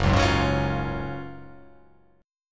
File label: F sharp Major 7th Suspended 2nd, F sharp, down